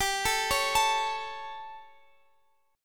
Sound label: G Suspended 2nd Flat 5th; arpeggiated; x,x,17,14,14,17